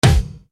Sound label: POWWRRR PERC, Yamaha RY-30